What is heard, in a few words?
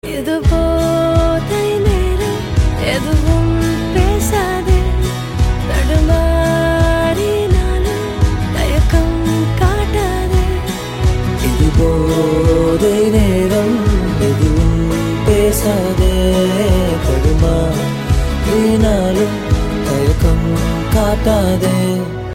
Female Version Ringtone